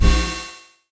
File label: Dead Sun Shot